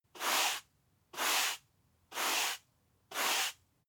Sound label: broom sweep slow sequence